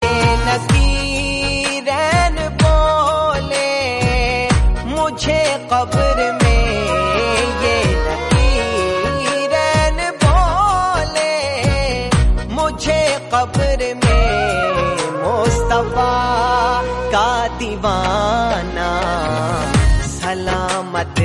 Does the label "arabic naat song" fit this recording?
Yes